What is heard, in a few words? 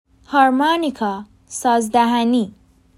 harmonica